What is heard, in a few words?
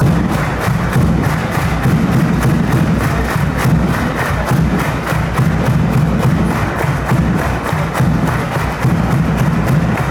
drums, fanchants